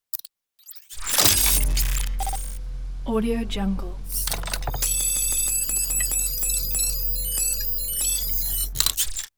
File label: devices auto scanning engaged and lock; robotic lifeforms 2; enemy